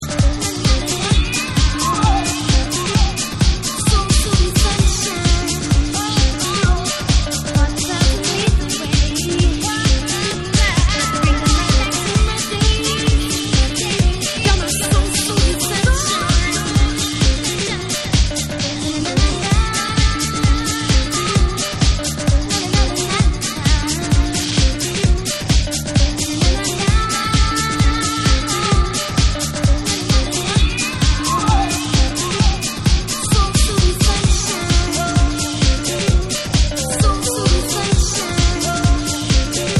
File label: Classic Vocal Mix